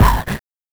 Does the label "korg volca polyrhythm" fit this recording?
No